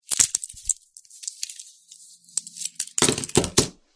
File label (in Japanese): 時計を外す